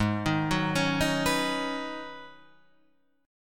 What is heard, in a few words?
G sharp Minor 11th G sharp, guitar chord; G#m11 Chord {4 6 4 6 4 7} arpeggiated